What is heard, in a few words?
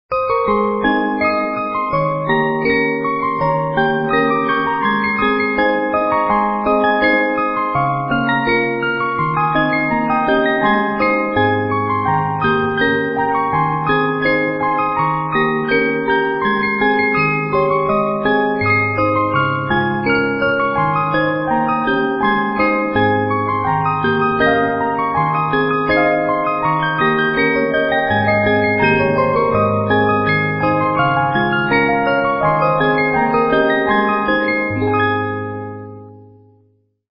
Magic Flute Glockenspiel; 50 Note